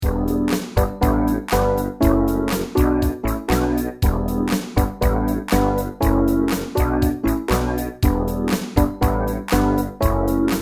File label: Talk, Funk Disco Basses; UA Theme Packs